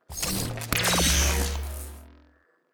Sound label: equip, sword; bane